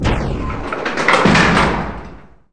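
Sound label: door close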